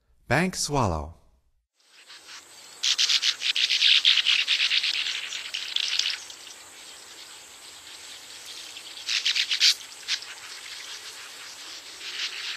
Bank Swallow, Songs of Birds of North America; Stokes

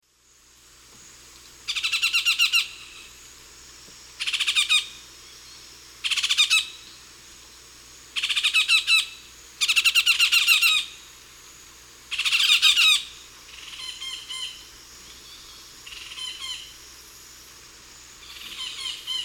Melanerpes cruentatus, group calls; S. America; Eastern Lowlands

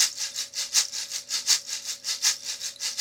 rainstick 80 bpm, latin

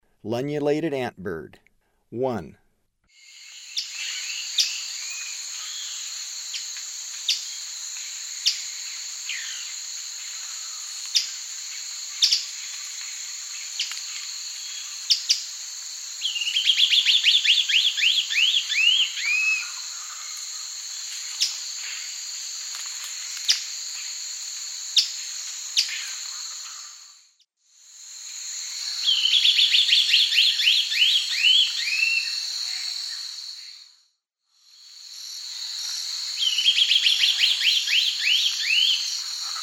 Lunulated Antbird; S. America; Eastern Lowlands

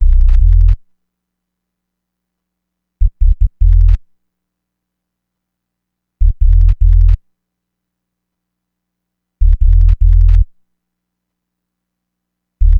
Bass Hip-Hop

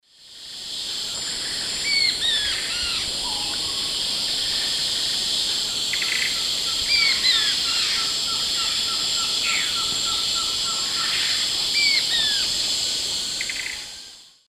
Myrmotherula longipennis zimmeri, call; S. America; Eastern Lowlands

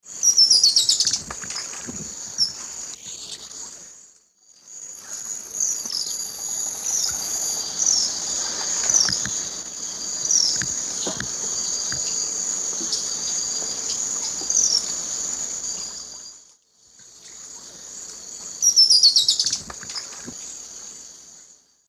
Chloroceryle aenea, calls 2 birds; S. America; Eastern Lowlands

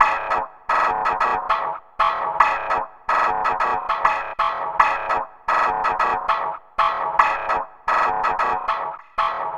Radio Beat; Electronica

